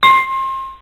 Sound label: Zoom Error; LG CU920 Vu